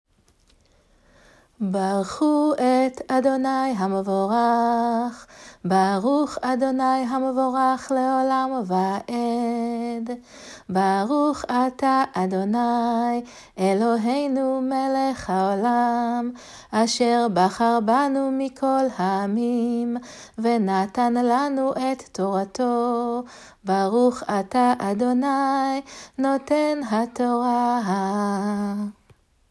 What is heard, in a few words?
blessing before reading from the torah